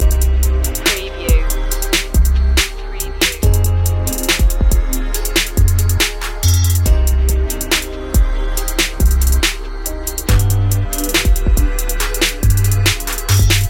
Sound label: trap beat loop